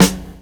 SD, snare, 70 s Breakbeats

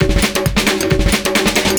PERCS, 70 s Breakbeats

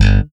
Bass, pull G1; Sampleheads; Will Lee Bass Library

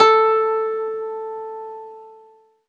ZITHER, Alpine Volksmusik

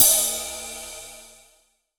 Main Room Cymbal; essential drumkit

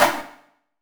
HIT 4 FX; Bolder Sounds - Granular

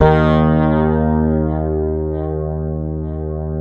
fingered bass D#2; Sampleheads; Will Lee Bass Library

